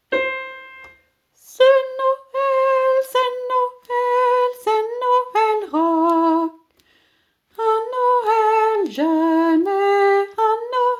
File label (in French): sopranes chante